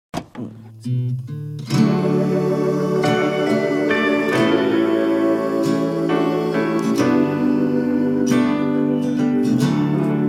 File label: Blues Ballads